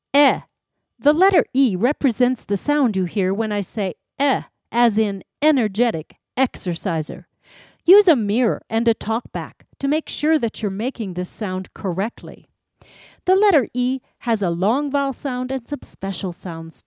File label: Claps